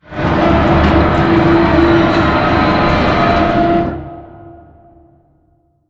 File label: Giant Door Open Move; Prop Warehouse; Titanfall 2; Boomtown